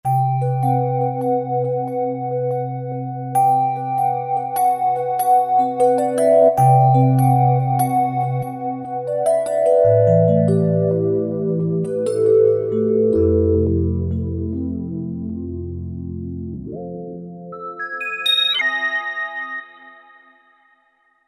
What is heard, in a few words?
Tin E-Piano, Roland V-Synth; Factory Patches